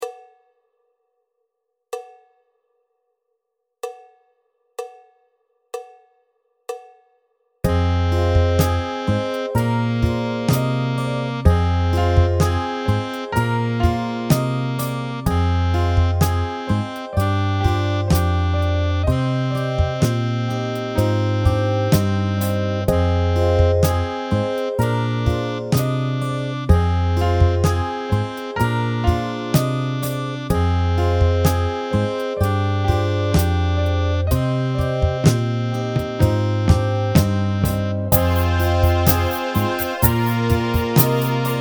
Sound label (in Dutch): Langzaam